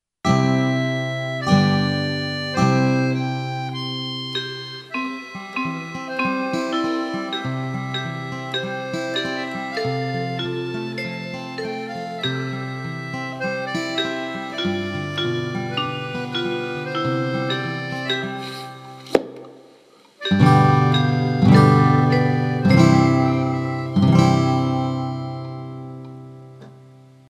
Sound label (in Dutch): lied karaoke